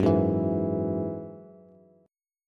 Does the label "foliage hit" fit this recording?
No